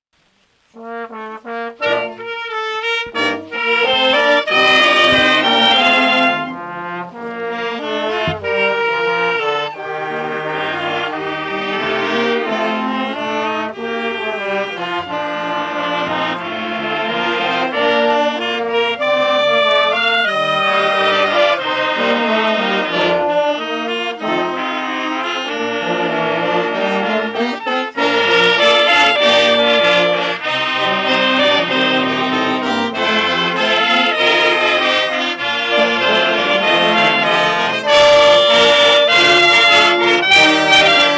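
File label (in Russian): Духовой оркестр; Молодёжное Киев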